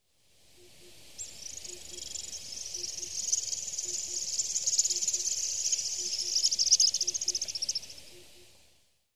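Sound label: White-throated Needletail, doto; koe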